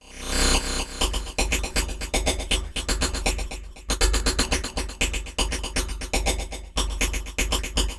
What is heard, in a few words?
Percussion Loop; Electronic Point Blank